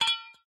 Metal Drop; Game rip; MiSide

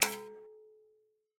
Lamp Deactive; Game rip; MiSide

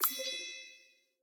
Delete Game UI; Game rip; MiSide

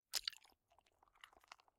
Mita Eat; Game rip; MiSide

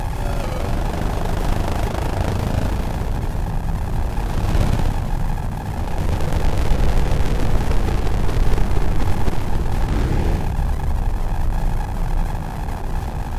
Glitch; MiSide

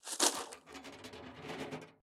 Cartridge Trash; Game rip; MiSide

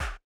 Slap; Chibi; MiSide

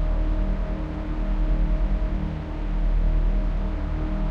Ambient; MiSide

